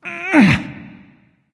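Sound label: nonverbal grunt lift; smite; voicepack; ullr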